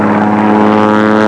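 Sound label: prop plane, transportation; Web Clip Empire 150,000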